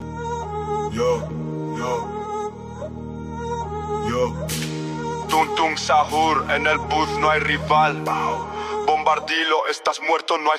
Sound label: drill Sahur